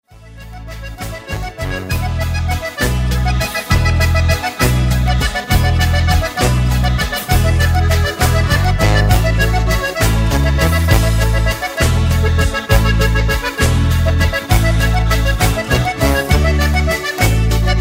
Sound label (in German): Landler